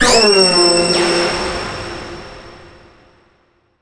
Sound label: falling; Quake III Arena